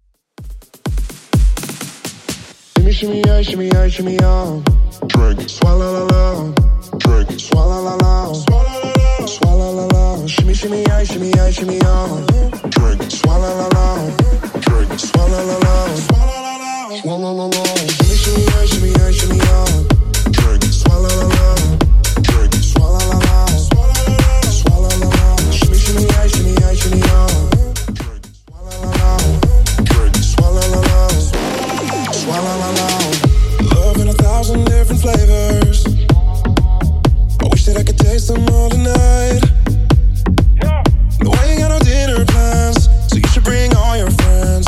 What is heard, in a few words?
House Redrum 126bpm; Bootleg